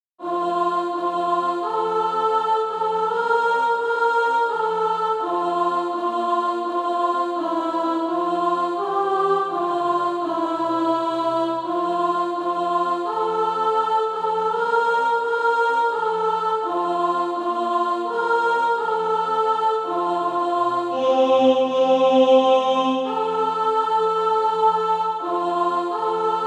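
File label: alto; Christ Who Left His Home in Glory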